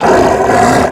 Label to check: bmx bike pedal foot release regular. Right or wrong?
Wrong